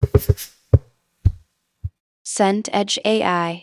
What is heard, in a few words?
footsteps carpet